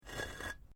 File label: Brick Medium Hollow Move